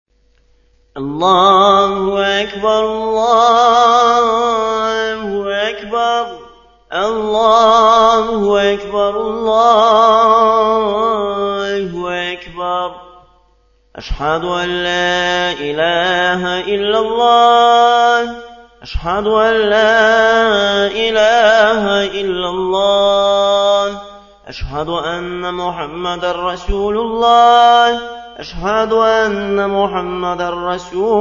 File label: azan